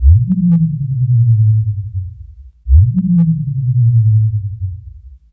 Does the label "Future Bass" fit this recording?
Yes